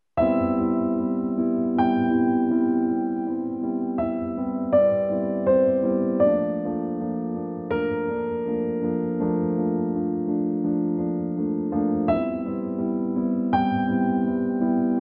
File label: PIANO